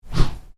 Swish: Short, Slow, Whoosh; Whooshes (Sound Effects:Sound Design)

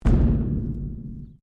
Strike Metal Hollow Tank Soft

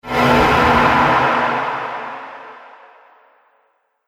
Epic Sf Explode Impact; Sci Fi Sound Effects

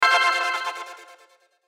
Hit Burst Synth Pulse; Production Element Imaging Element Accent Transition; Blastwave

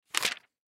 Magazine Page Turn; Paper; Materials; foley; Blastwave

